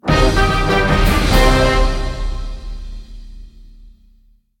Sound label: Big Orchestral Lose Fanfare